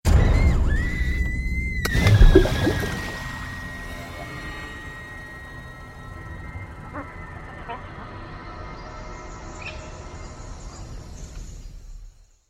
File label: Bridge Fall Water; The Cursed Island Mask of Baragus